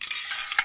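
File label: crossbow reload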